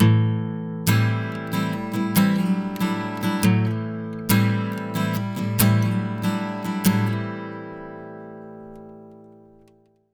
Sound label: 70 MIN CHD A, Acoustic Guitar Loops; USB Soundscan vol.04; AKAI